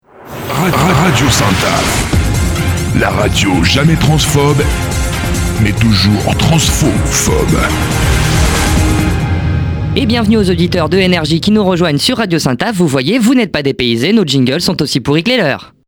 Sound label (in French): JINGLE PAD; transfophobe